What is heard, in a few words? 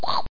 Chomp; Effects; Scratch